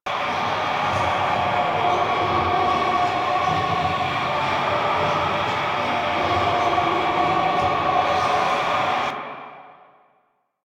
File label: Spear Gun Buff Aura Loop; Weapons; Warframe; Tenno; Hoplite